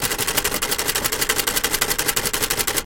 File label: Simulated Teletype; typewriters